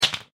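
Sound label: Drop Metal Object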